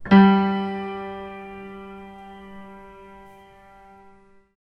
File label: one shot piano key goth